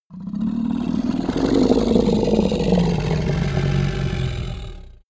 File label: minotaur grunt short; kreaturen